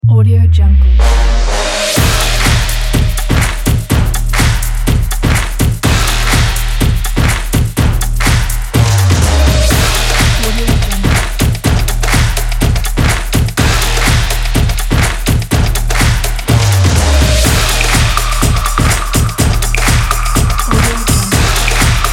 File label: this is drums